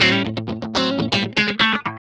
GUI, LICKS; 120 LICKS

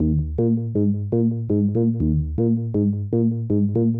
SH101 Bass Loop (120BPM), retro house

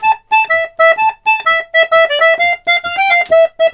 accordion, Complete Accordions; USB Soundscan vol.40; AKAI

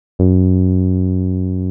TB 303; VEL SW SET

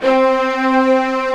STR SEC C3, STRING SEC

